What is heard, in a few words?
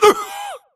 grunt death neck; Titanfall 2